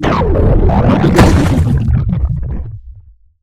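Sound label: alien die; Cosmic Rage; combat; ENEMY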